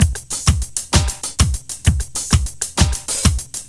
DRL 130; Sounds Good - Eurotech

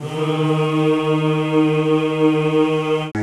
CHO ES2; Sound & Vision; Gigapack I CD 2; CHO Zzzz + Vvvv; CHO Vvvvv

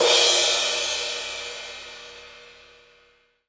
Gretsch Cymbal Crash; 1967 Gretsch Kit; One-Shots; Sonic Foundry (Sony Creative Software); Vital Drums

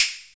FX DRM, Analog; Sound & Vision - Gigapack II

